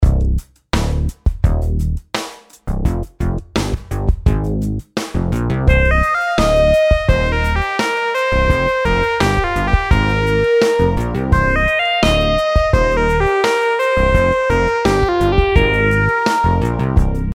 rhythm simple 8s triplets

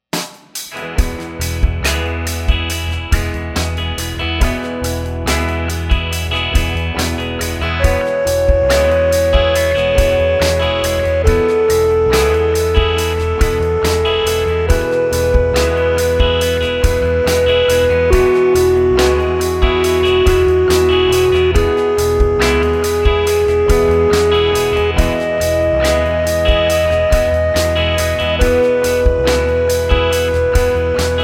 scale degree

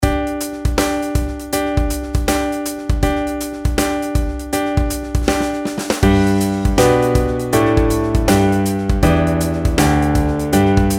pitch patterns skipwise

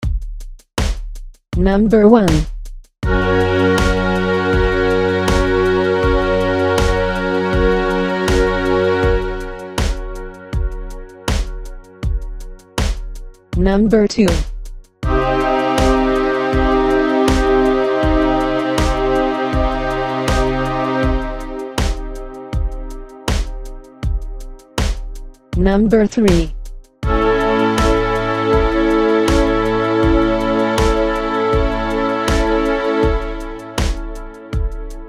chord quality id

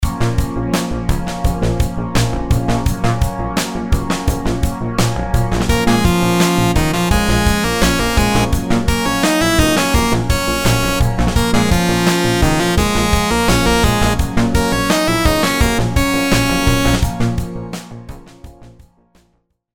rhythms simple dotted 8s 85

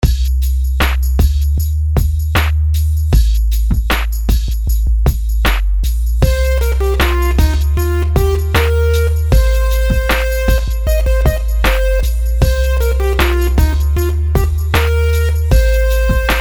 rhythms simple 16ths

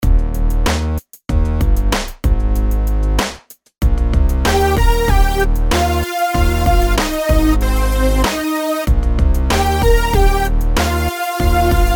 melodies simple Ebmaj; melody

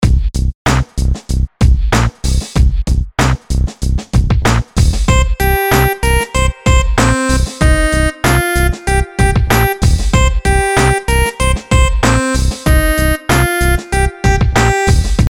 rhythms dotted eighths

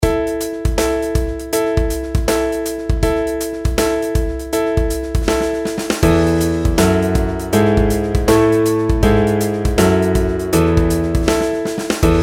pitch patterns stepwise

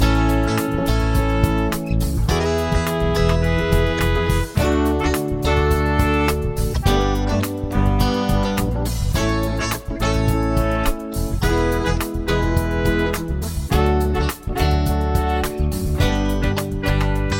chord prog with mixture

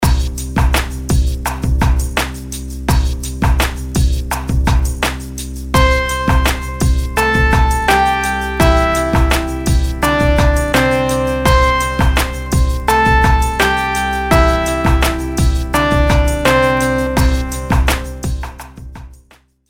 melodies simple Cmaj; melody

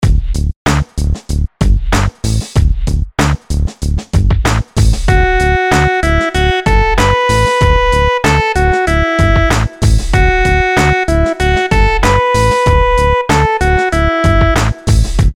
melodies simple syncopated, Dmaj; melody

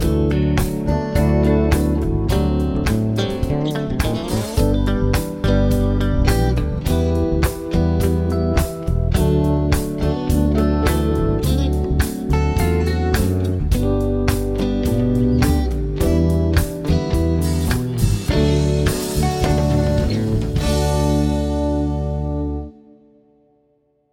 progs with applied chords

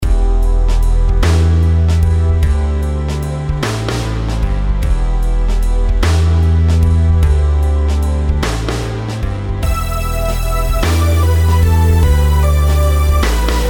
rhythms compound 8s

